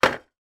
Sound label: game barrel put down on table; pop up pirate